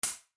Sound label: Tap